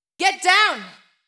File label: VOICE GRL HOUSE 125, House Voice; eJay